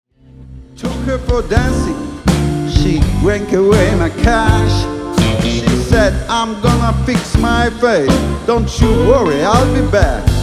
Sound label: Melody; muzyka dawna